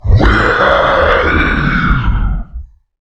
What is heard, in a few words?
scream; zoochosis shark; ZOOCHSHARK